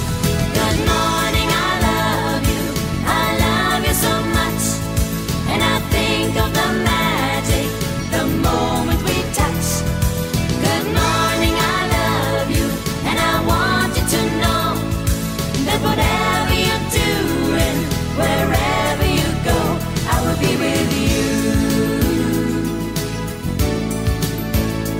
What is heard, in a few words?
Ringtone